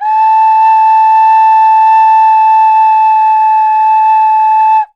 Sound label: Bamboo A5, Winds; Polyend; Simulacrum Sounds; 1 Shots; Acoustic and Electric